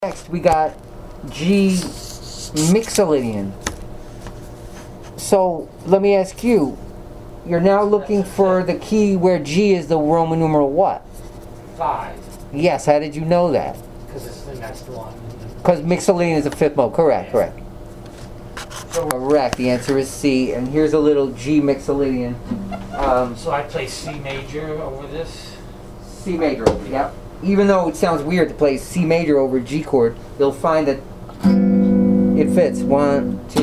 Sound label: modes with common root of g mixolydian